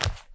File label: Footstep Linoleum R